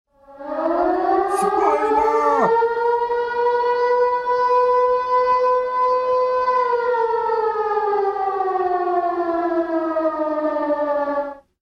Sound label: spoiler alarm